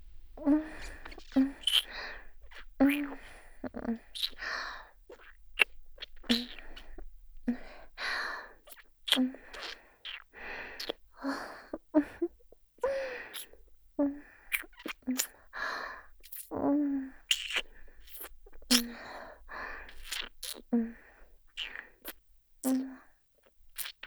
ひたすら キス

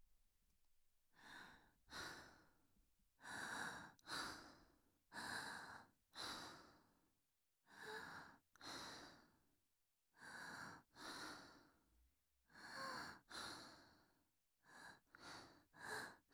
呼吸音

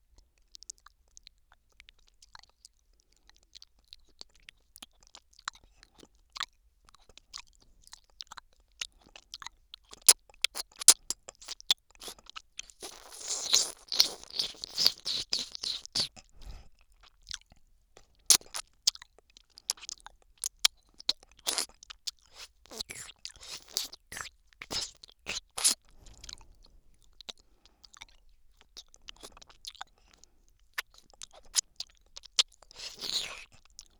左耳 アイス舐め

